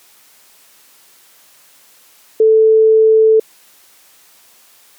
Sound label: audio input noise levels; canon xh series